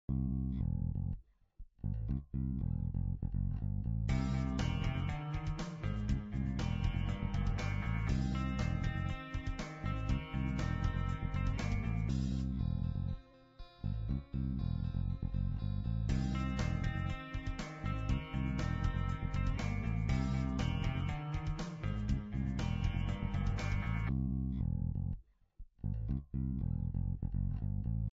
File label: Dramatic